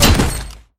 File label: good headshot